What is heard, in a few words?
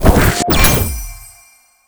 SFX spell harness magic